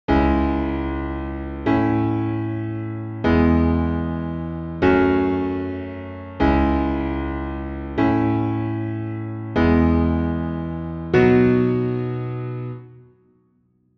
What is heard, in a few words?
Verse Chords Full